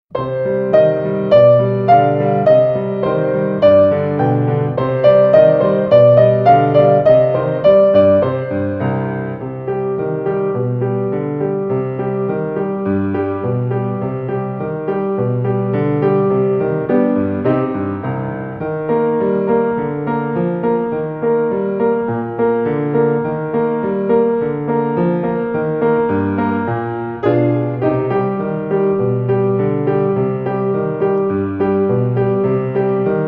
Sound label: theme and variation tpt accomp only